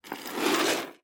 zvuki tsepei

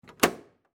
zvuki kseroksa

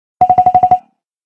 originalnye ringtony nokia 5310 xpressmusic

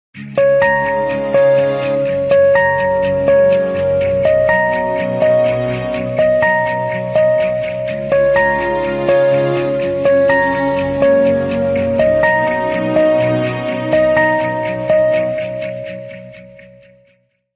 Nokia 808 originalnye ringtony i uvedomleniia

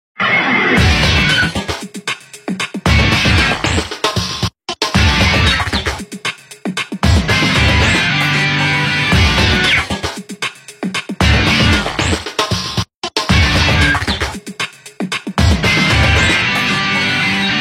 originalnye ringtony Nokia 5310 XpressMusic

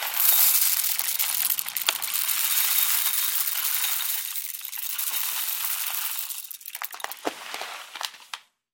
zvuki kofeinykh zeren

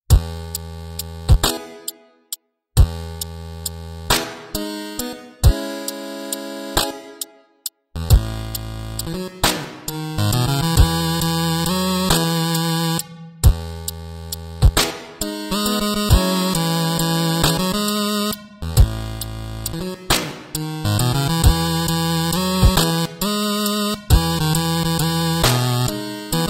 8-bit muzyka na sotovyi telefon ringtony

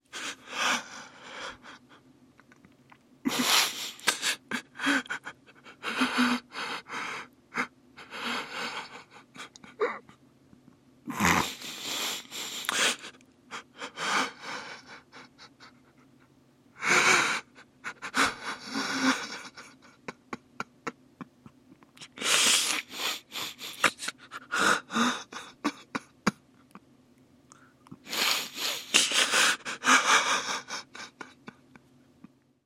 zvuki muzhskogo placha

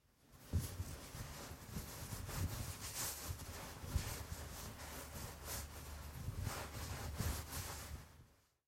zvuki vafelnogo polotentsa

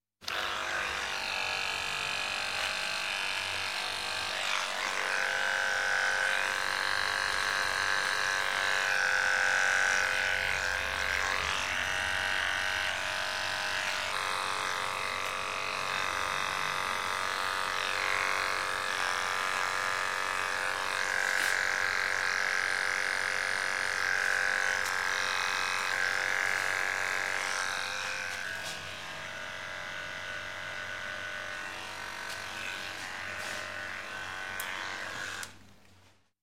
zvuki mashinki dlia strizhki volos

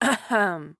zvuki pershenie v gorle kkhm

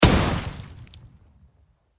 zvuki khedshot

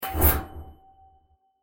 zvuki volshebnoi fei

